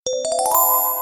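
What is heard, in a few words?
short magic sound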